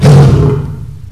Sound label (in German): löwe; Amiga-CD - Volume 2; dolbytest